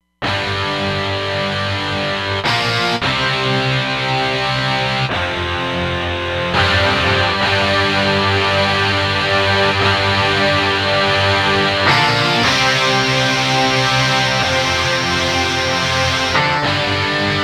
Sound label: Power Chd; Roland Fantom XR